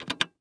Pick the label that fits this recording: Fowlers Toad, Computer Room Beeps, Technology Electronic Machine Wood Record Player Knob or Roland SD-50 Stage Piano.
Technology Electronic Machine Wood Record Player Knob